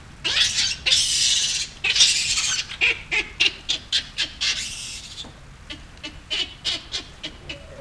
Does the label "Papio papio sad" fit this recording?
Yes